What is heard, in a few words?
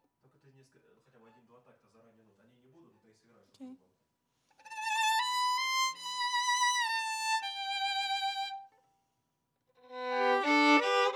Viola